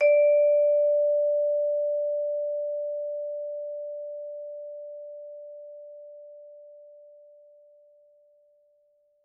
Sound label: Vibes soft D4, Vibraphone, Soft Mallets; Struck Idiophones